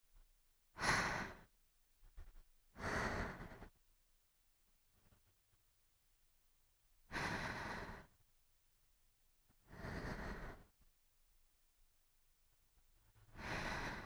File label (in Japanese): 寝息